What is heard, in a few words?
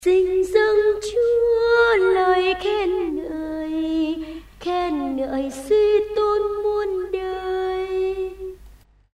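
soprano tenor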